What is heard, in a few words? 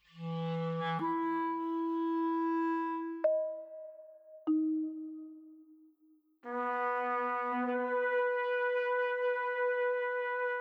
Intervals – octaves (12 semitones); Sound-makers